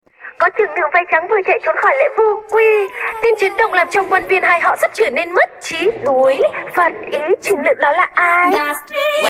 vocals

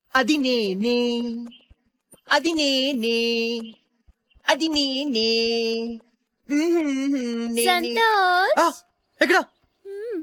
happy vocals

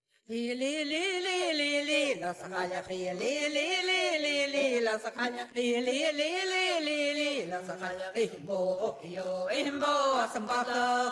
traditional celtic song, vocals; lal, lal, ars’ a’chailleach

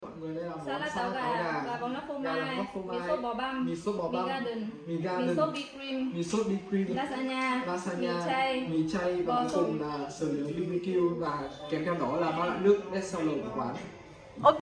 vocals

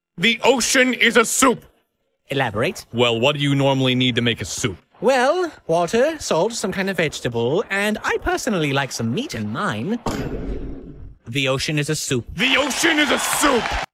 vocals; [undertale] the ocean is a soup!

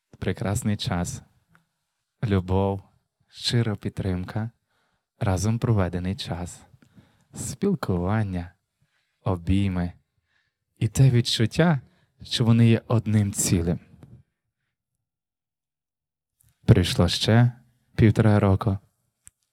vocals

